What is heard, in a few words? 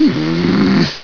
groan